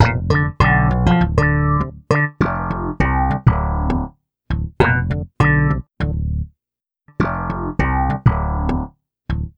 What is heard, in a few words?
Philter (snap bass); Sonic Foundry (Sony Creative Software); Ugly Remnants VOL-2